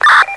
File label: mic click off, radio sounds; teamspeak